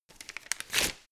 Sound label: tear thin paper